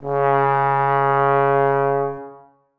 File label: tenor trombone c#3; Sonatina Symphonic Orchestra